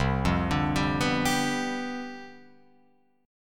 C Major 7th Suspended 2nd Suspended 4th C down Guitar Open F, chord; CM7sus2sus4 arpeggiated; JGuitar